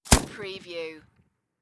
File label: Machine Gun PKM